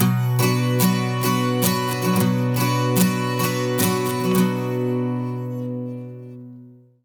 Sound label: 110 MIN 12 STR, Acoustic Guitar Loops; USB Soundscan vol.04 - Electric & Acoustic Guitar Loops; AKAI